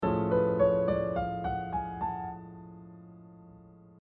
ionian #5

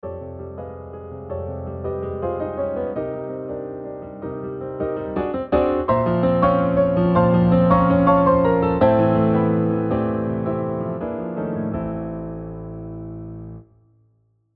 dynamics - interval tension

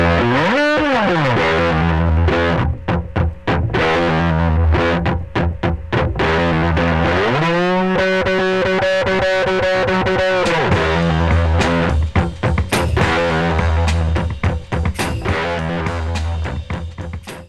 Guitar & drums

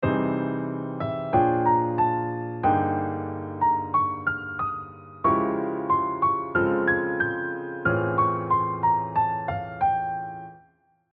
two-octave scale - harmonized with polychords

